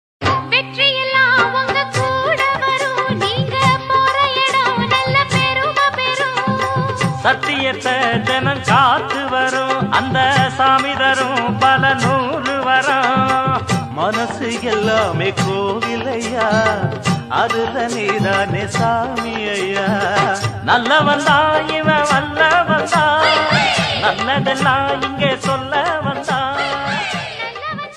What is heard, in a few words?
tamil song; tamil kuthu song